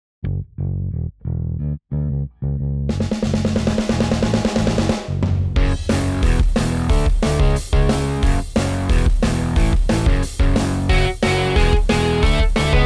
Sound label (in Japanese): ブギー・ウギー